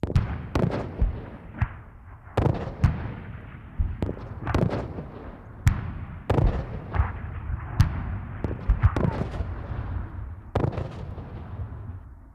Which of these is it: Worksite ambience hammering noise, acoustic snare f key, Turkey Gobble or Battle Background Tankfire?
Battle Background Tankfire